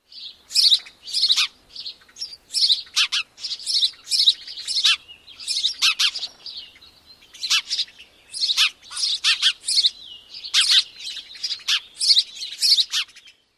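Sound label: House Sparrow, see a dit and chew-chew; NY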